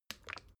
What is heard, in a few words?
bottle syrup upend bright; berufe; gastronomie